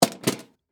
Lunch Box Set Down, Foley, Lunch, Box, Set, Down, Metal, Case; Set Downs; Blastwave